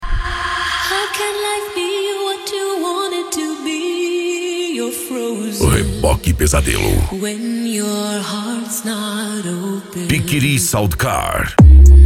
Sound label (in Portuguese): sound car